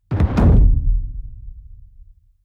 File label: heavy sub noise hood